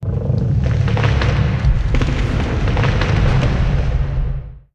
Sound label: HOLY STONE KEEPER war walk